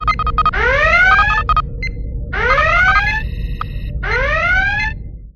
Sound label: red alert; TNG